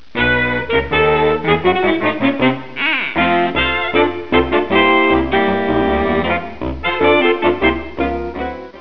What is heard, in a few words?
sax sound